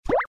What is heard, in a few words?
sound hint; playableads